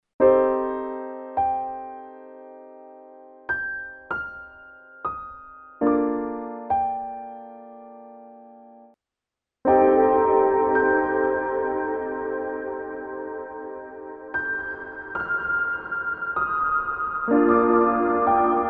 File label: 8 Sec Reverb